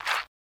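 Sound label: Squishy Squeak Whoosh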